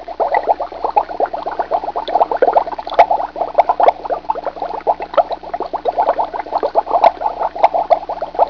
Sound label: Bubbling (Labratory Sounds)